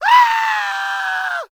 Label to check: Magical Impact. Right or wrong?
Wrong